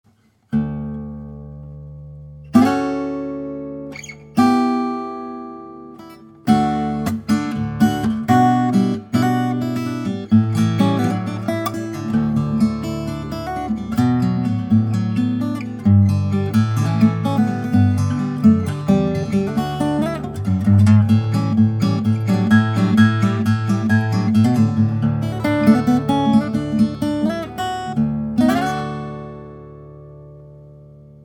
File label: Guitar